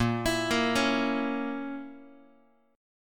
Bb Diminished Bb Guitar; arpeggiated; JGuitar

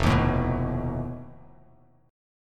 F 7th Flat 5th F Bass Standard; F7b5 strummed; 1,6,7,4

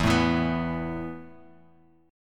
E Suspended 4th E, down, Bass Standard; Esus4 strummed

